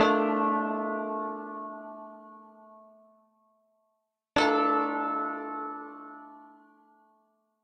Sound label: Dissonant Chord Amin 110 bpm; MIDI Clips; Tonal; Atmospheric; Ableton; Factory Packs; Mood Reel